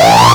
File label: Industrial Analog Single Notes Short